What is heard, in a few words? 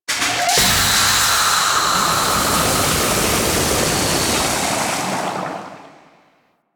Ocean Depressurize; Ambience; Warframe; Grineer Ocean